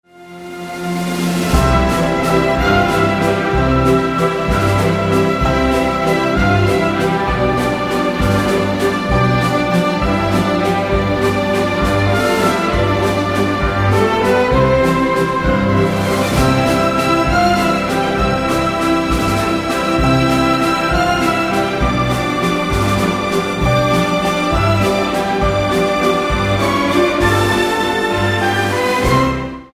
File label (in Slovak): golovy valcik